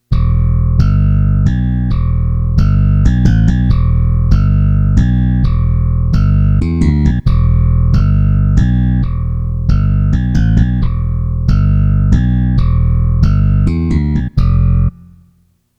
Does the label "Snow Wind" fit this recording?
No